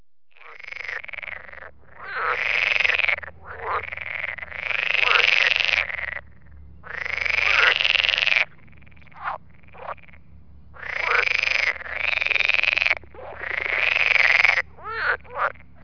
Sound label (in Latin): rana esculenta